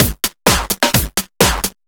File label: Housebeats 128BPM; Vengeance Samples